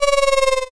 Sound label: sheep